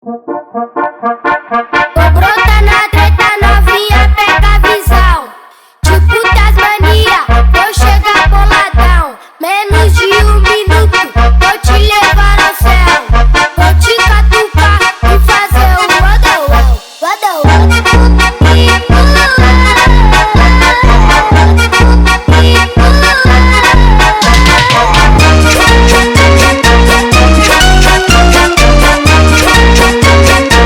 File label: funk do bounce brazilian funk